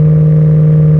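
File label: engine exhale, advanced engine sounds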